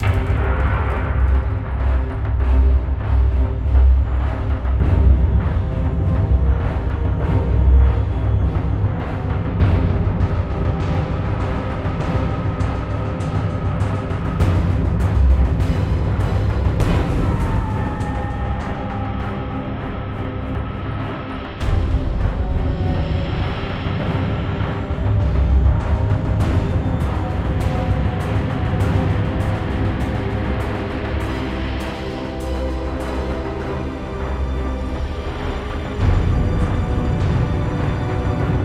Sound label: music molten action; the long journey home